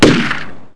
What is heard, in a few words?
sniper